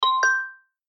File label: chat